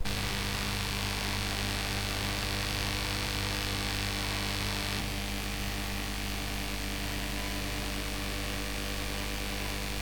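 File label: guitar shield noise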